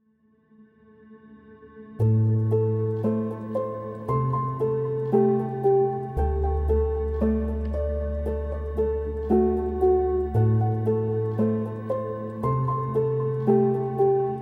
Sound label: relaxing